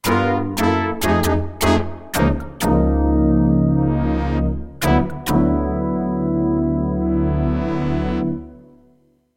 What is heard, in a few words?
Mallet Brass